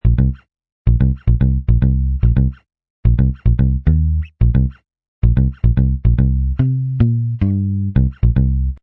Bass Full Chorus Riff A, Bass riff in A# at 110bpm.; Bass Loops